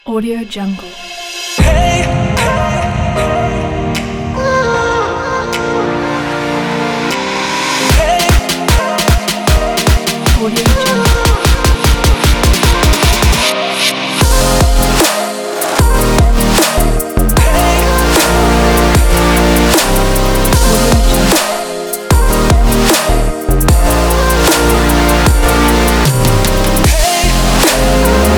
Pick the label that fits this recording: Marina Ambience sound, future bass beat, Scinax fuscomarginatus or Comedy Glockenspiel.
future bass beat